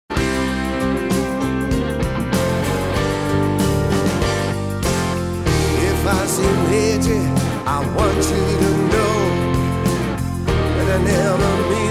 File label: Gospel Medley; 8 Gospel Medley (20 Songs)